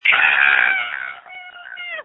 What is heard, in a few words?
screams